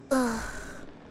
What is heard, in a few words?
hope noises